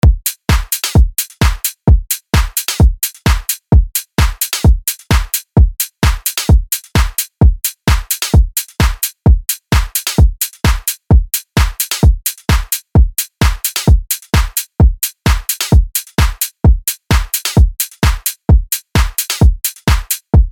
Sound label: Simple Drum Loop